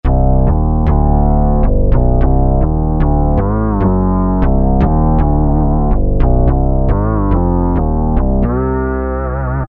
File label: Low End Bass, Korg N364; Factory Prog demos